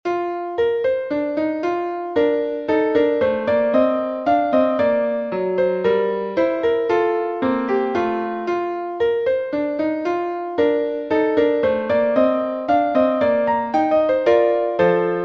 piano piece; mayer emilie

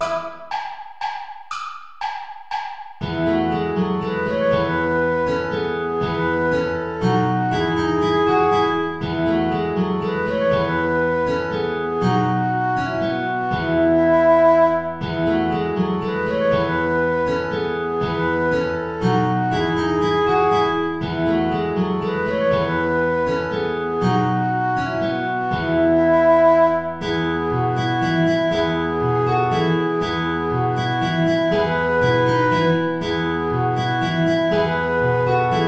saltarello play along without guitar

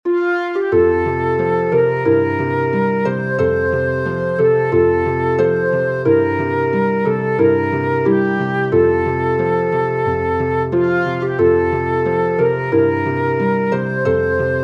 for flute harp; bishop henry rowley; home sweet home

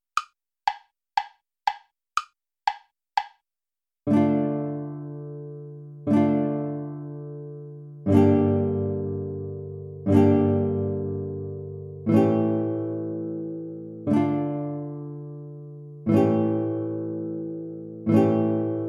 hevenu schalom alejchem play along; traditional

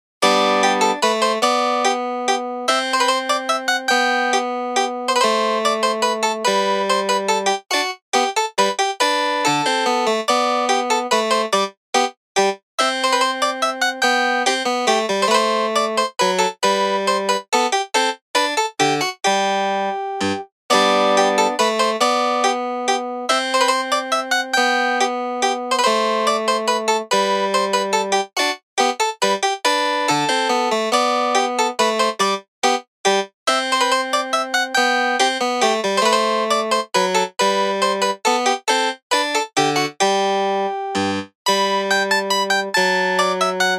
minuet major; petzold christian

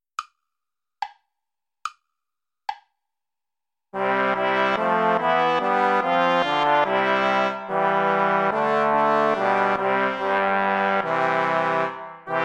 play along without trumpet; praetorius michael; nach gra ner farb mein herz verlangt

